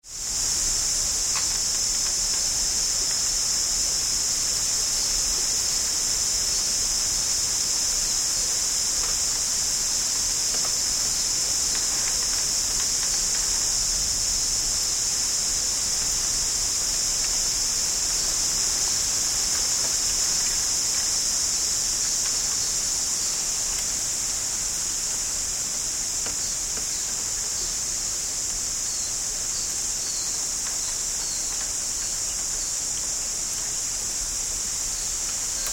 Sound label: Insects Tropical; Tom Hutchings